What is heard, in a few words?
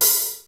ROCK HH, HR-16; Hollywood Edge; The Big Bad Beatmachine; HR-16B